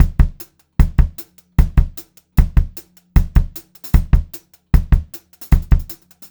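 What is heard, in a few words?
152 speed 2 beat, pop rock drum loops; USB Soundscan vol.37; AKAI; 90 s Sample CDs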